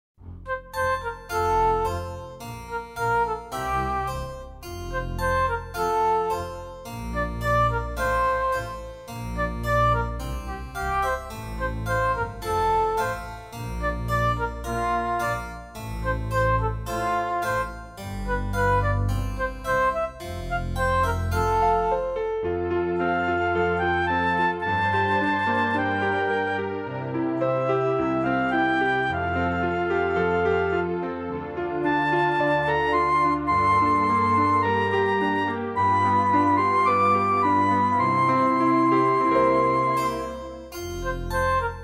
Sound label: instrumental; Ban Canta y zwaai